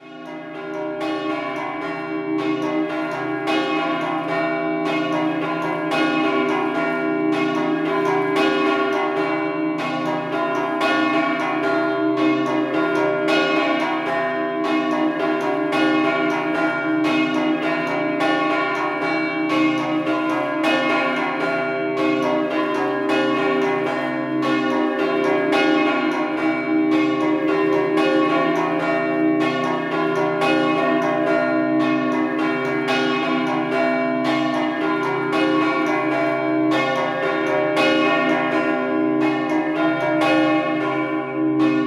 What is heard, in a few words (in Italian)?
Mels Scampanottata